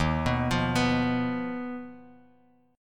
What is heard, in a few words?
D sharp Suspended 2nd Sharp 5th, Guitar, Open G; 1,4,3,4,x,x; chordshape